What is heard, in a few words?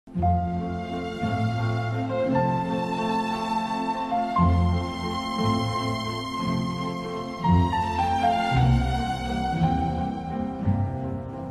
Piano Concerto No. 21 Andante; Wolfgang Amadeus Mozart